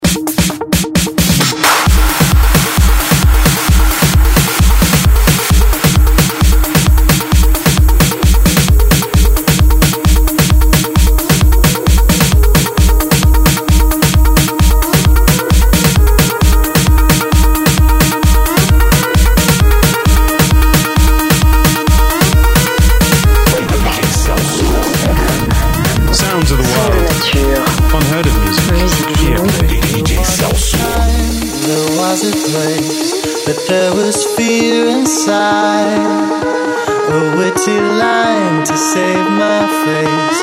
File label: electro house